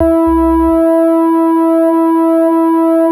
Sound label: SYNTH, Houseworx keys